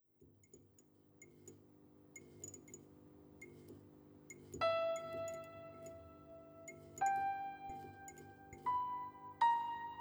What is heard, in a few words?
Mop Menu